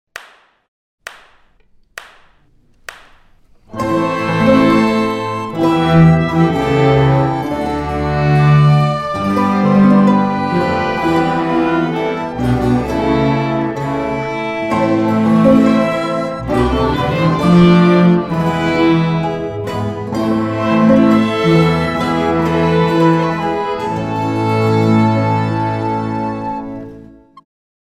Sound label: orchestra